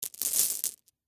Marble Stones Down, Texture, Marble, Stones, Set, Down, Handful; Miscellaneous Toy Sound Effects